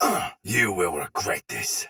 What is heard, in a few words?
damage low chance soldier sword agent male; Dialog; Barks; Battle; Melee; Sinecure